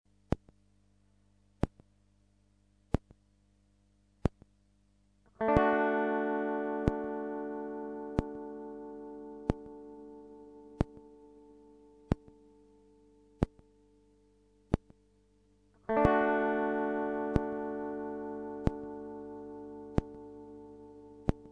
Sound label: chord feedback